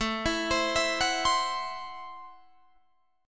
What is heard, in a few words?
A Minor 6th A Guitar DADGAD